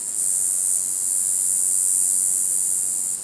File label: antlion hill ambient; de submarine